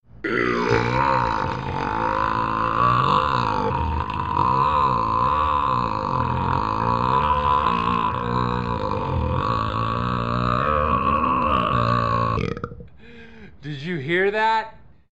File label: Burp; Elf